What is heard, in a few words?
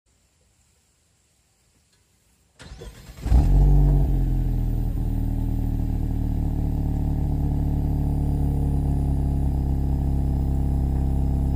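Talisman, car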